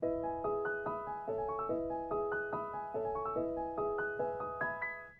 jeux d'eau

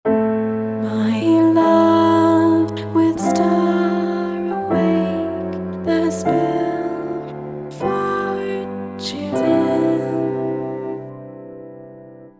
harmonize cliche

